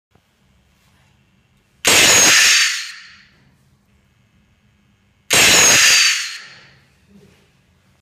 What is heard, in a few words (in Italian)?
Suono Sirena Turbo